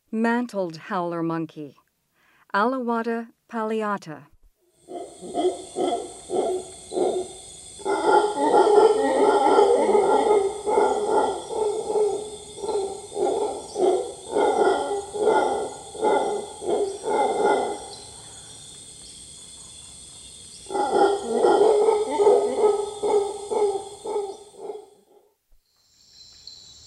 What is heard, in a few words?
Mantled howler monkey; S. America; Rainforest